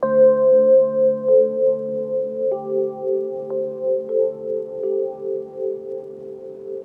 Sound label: 140 Dm EPiano; Touch Loops; Textural Electronica Mini